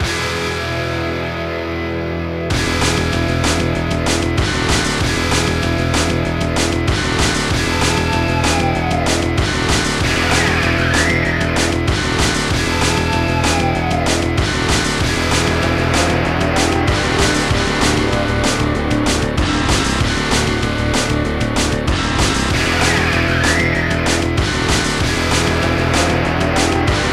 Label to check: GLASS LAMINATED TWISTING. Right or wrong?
Wrong